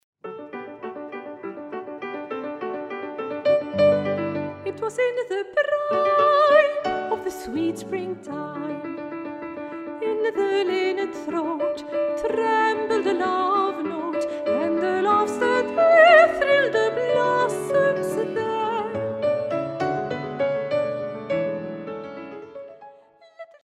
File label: Sweet springtime; Stanford